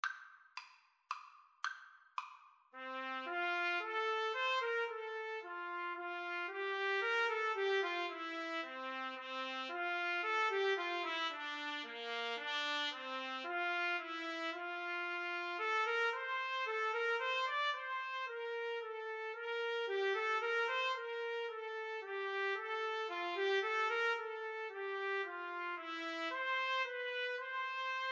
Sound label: trumpet duet